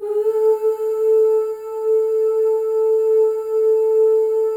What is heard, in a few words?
OOSC, FEM OOS, Voices